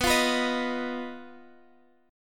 B-Major Flat 5th B down Guitar Standard; strummed; JGuitar

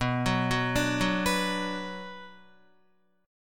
B Minor, Guitar; arpeggiated; guitar chord

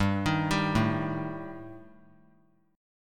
G Suspended 2nd Sharp 5th; Gsus2#5 arpeggiated; JGuitar

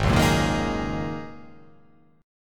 G sharp 9th Suspended 4th G sharp

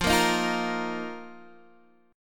F 7th Suspended 4th, Guitar DADGAD

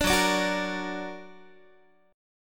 Gb Augmented Gb Guitar; Gb+ strummed

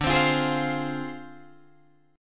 D Minor 7th Flat 5th Guitar Drop D

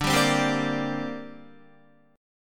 D 7th Suspended 4th Sharp 5th, down, Guitar; D7sus4#5 strummed

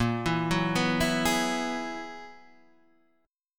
Gb 11th Bb

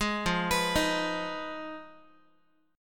F Minor 7th Flat 5th F down Guitar; arpeggiated; JGuitar

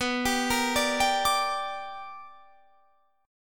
B Minor Major 7th Sharp 5th Guitar Standard; arpeggiated; JGuitar

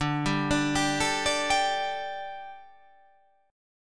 D Suspended 4th D

